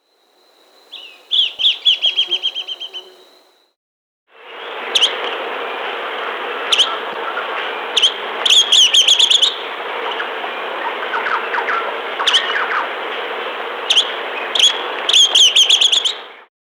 Red-Billed Tyrannulet (Zimmerius Cinereicapillus), Andean Birds; S. America